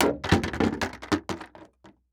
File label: Impact Bucket Plastic Drop On Boardwalk